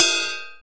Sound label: DAMPED RIDE D; Greytsounds VOL-1; SDX HATS+CYM